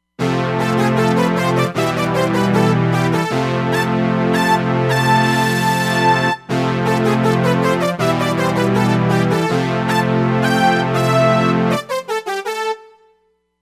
Saw Brass, Roland Fantom XR

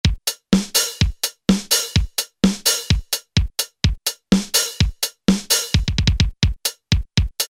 1 Key Beat, Kawai K1m; Factory patches - I banks

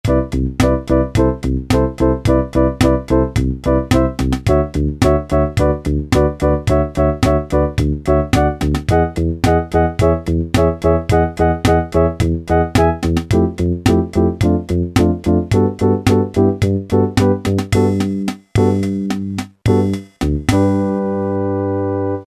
FUSION, Yamaha SHS-10; Factory style demos

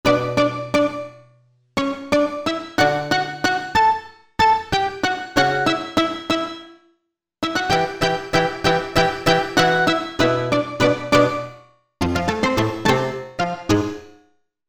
2 voices; Korg MSC-12S Synth 3

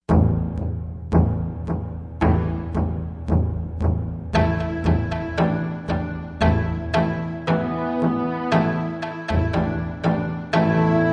Oriental, Yamaha TG55; Factory Presets

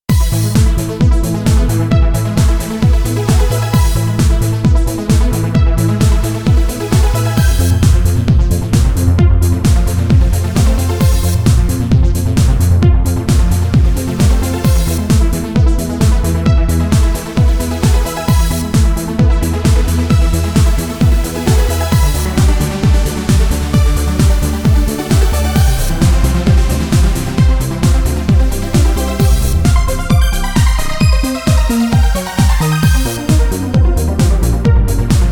Trance Build, Korg Radias-R

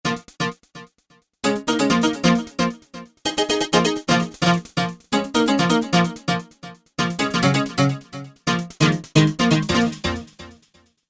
Rave; Rave-Slave

